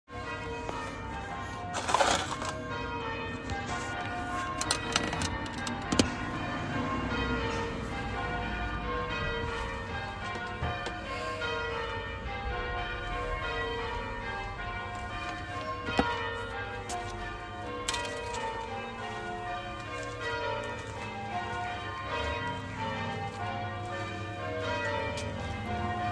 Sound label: Bells